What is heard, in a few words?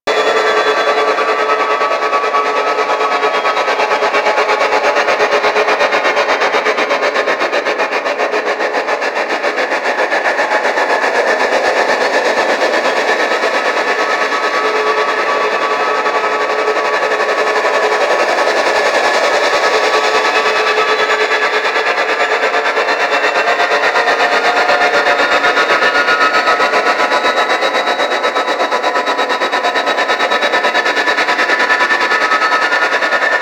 Archwing Healer Engine Loop Slow, Vehicles; Warframe